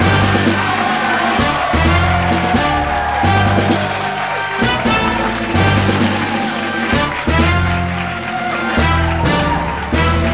Win Cup; Garfield Kart; Nintendo 3DS

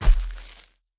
Footsteps Dirt Walk; The Town

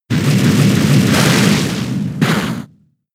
Flame Wheel; Pokemon; gen 6; XY; ORAS

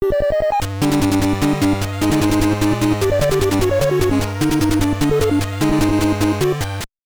Dance Practice; just breed nes; Sholoss Dance Practice

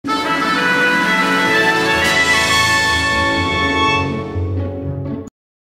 Congrats Screen Fanfare; Shrek the Third; PS2

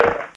boppp

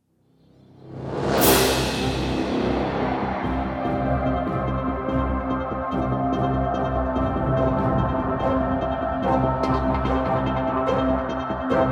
Combat Dogfight; Elite Dangerous

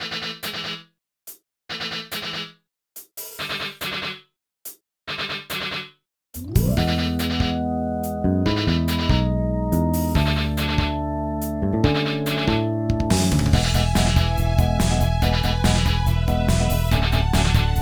Rock Music; Pinky Street Kira Kira Music Hour Pop Princess; Rocking Bell

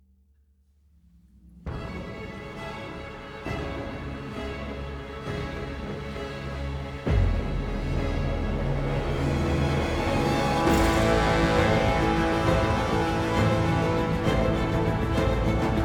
Default Loading Music

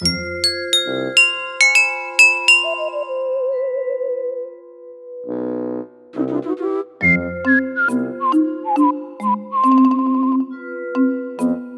Haunted Woods; New Super Mario Bros. U; Soda Jungle

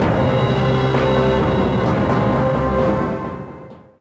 Industrial Agitated; Civilization Revolution

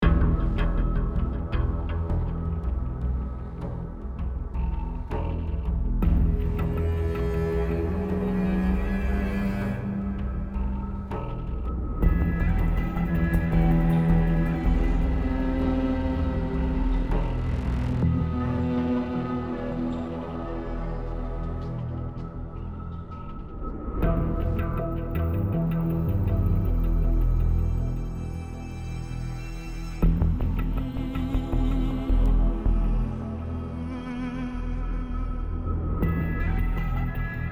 Exterior Music Ambient; Sin Omega

